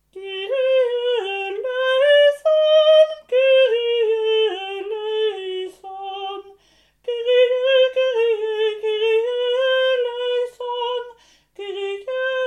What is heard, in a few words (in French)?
kyrie tenors; charpentier